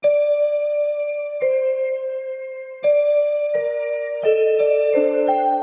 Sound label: Dark Melody Loop - 170 BPM G Min Glock; Melodies; Loop Stems; Cymatics; Oracle Sample Pack